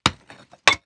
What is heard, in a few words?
Pick Axe Hit Concrete With Squeak, weapons; AdobeCC